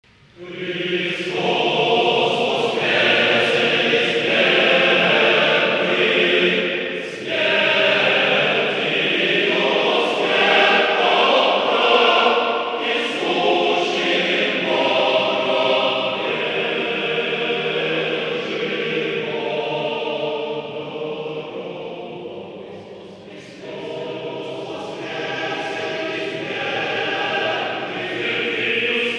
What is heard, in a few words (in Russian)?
Христос воскресе, male choir; male choir of mephi